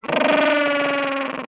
penguin gentoo long growl chirping groan

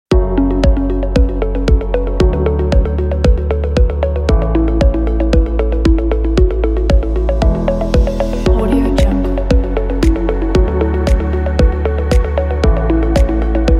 digital technology ambient

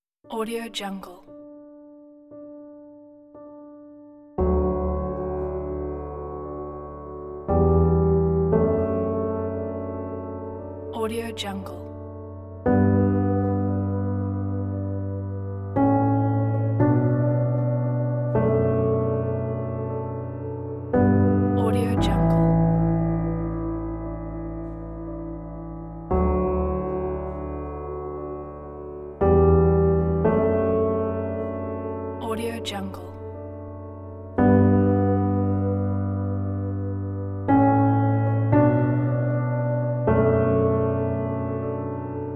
piano moment

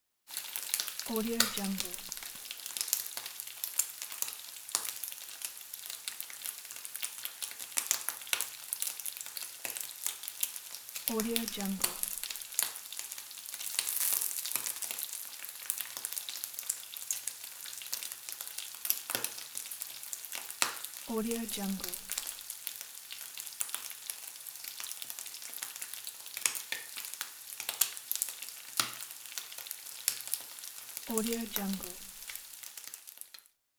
bacon frying in pan

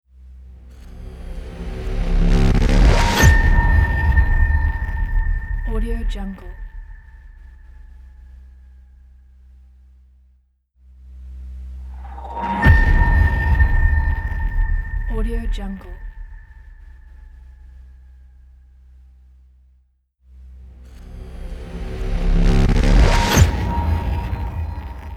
high tech scifi space logo

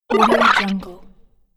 arcade game retro gloop

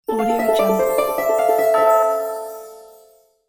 harp level magic bonus

